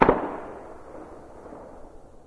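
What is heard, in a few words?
distant gun; random amb sounds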